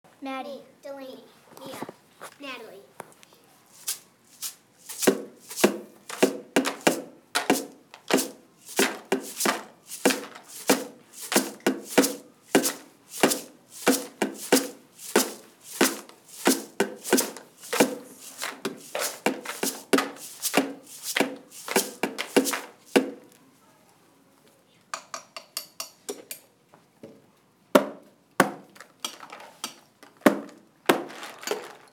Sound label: found instruments